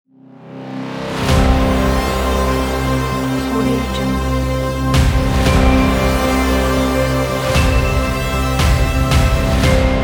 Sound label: cyberpunk action logo